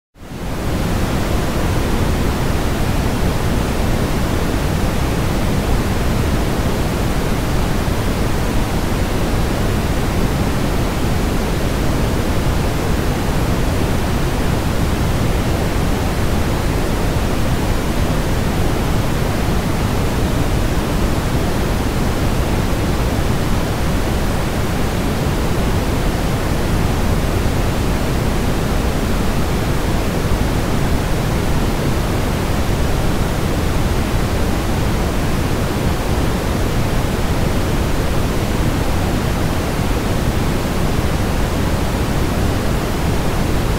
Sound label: Brown Noise